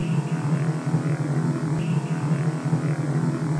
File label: song; vchat; OuterWorld